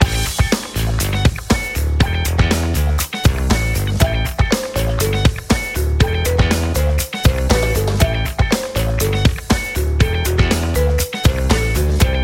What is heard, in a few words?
Podium; crash team racing nitro fueled complete gamerip; Crash Bandicoot - Coco Bandicoot - Fake Crash - Crunch Bandicoot - Baby Crash - Baby C